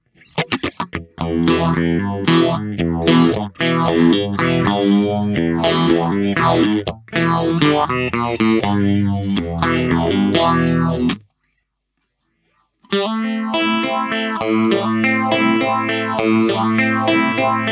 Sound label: MXR Phase 90; kemper